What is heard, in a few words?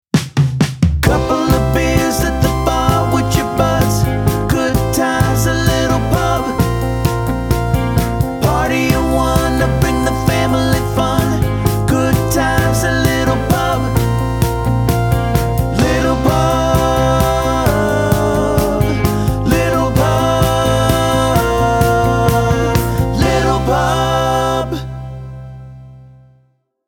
Jingle; Little Pub